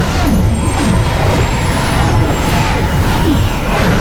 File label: loop; karma base